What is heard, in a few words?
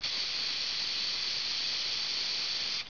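SPRAY CAN; 10,000 Graphics Pack Volume 1; Media Graphics International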